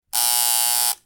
buzzer

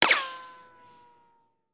bullet ricochet

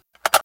assault reload; semi-automatic rifle handling

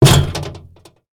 metal impact rattle, metal plate rattle hit

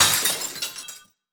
glass break

